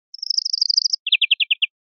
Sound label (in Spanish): primaveras, verderon